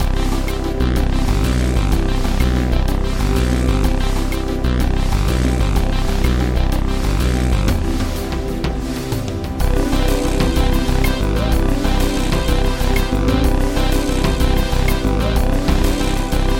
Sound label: Trance